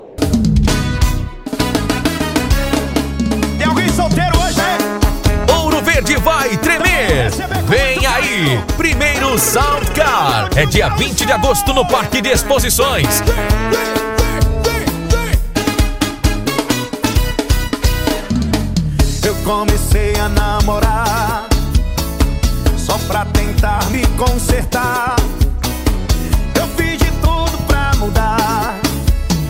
sound car

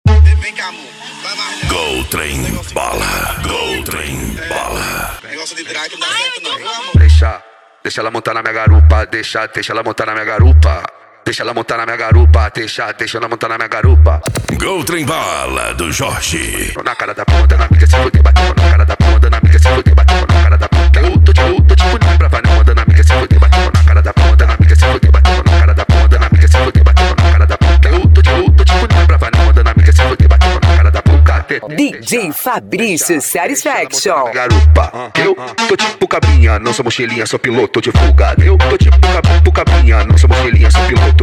funk mega bass

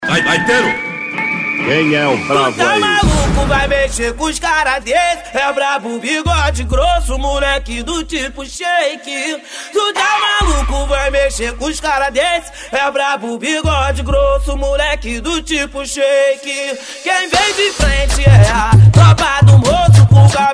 funk bass; impacto som

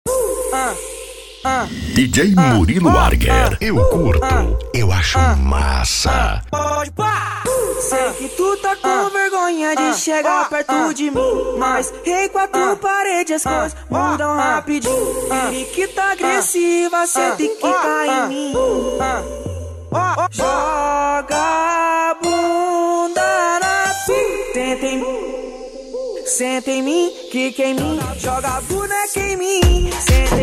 funk

